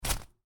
Footstep snow boot, Boots: Individual Footstep On Snow, Heavy; Human